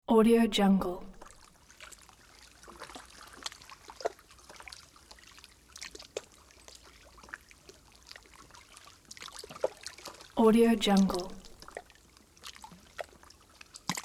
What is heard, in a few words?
lake ambience